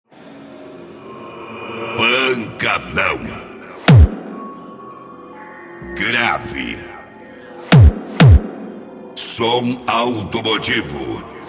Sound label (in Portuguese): pancadão automotivo